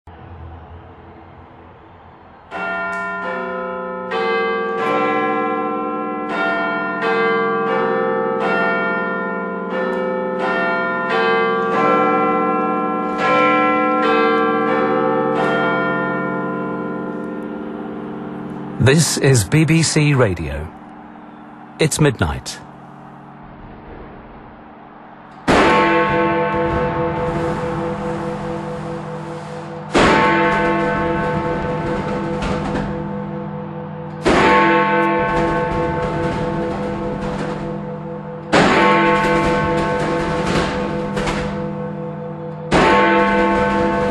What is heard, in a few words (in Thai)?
เสียงบิ๊กเบน